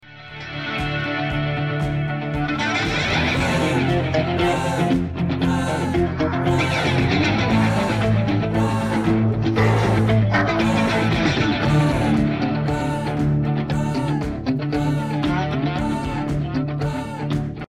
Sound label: Verse Fills